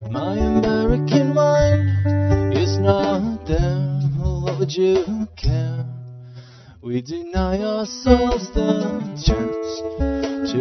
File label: harmonic